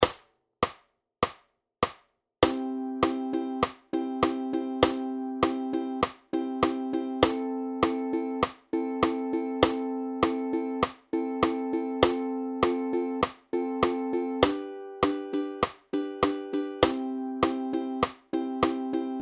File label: strumming, ukulele